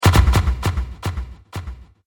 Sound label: Trailer Hit Shots; Trailer Sound Effects; sound design